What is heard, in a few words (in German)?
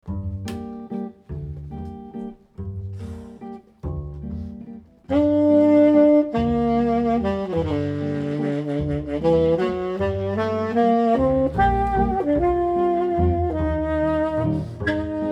lyrischer walzer